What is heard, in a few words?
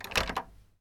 Locking A Door